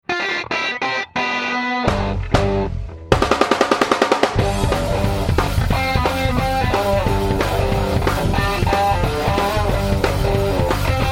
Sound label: sample humbucker